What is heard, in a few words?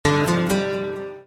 guitar sms